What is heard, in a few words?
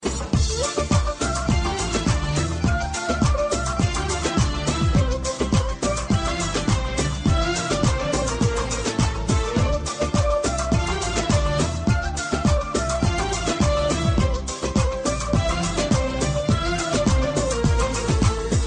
perfect pop background music tone ringtone; iphone ringtones